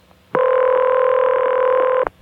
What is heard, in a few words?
ringing